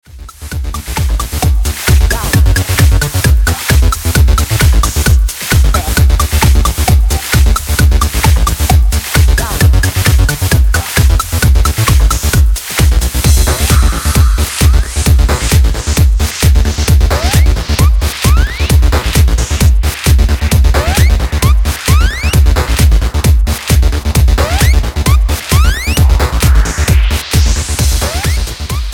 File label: disco radio